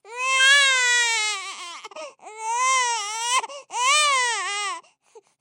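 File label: sounds of newborn crying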